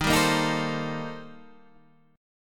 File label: C Minor 13th Eb